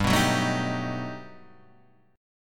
G Augmented Major 9th

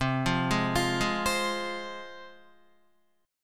C Suspended 4th Sharp 5th, C, down, Guitar; Csus4#5 arpeggiated; JGuitar

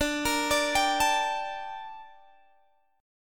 Bb Major 13th D

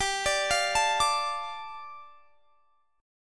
G 7th Suspended 2nd G; G7sus2 arpeggiated

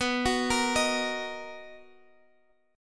B Major 7th B down Guitar Half Step Down

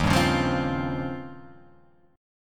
D sharp 13th D sharp

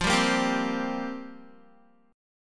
F Suspended 2nd Suspended 4th F down Guitar; Fsus2sus4; strummed

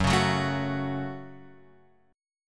Gb Minor, Guitar, Open F; strummed; JGuitar

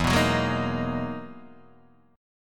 E-Major Sharp 11th E, Guitar DADGAD

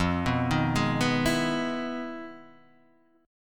E Major 7th F; Emaj7/F; arpeggiated; JGuitar

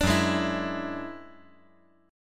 F sharp 7th Suspended 2nd Sharp 5th; strummed; JGuitar

